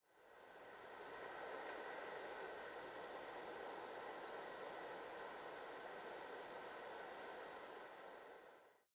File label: Common Reed Bunting Emberiza schoeniclus, Ladakh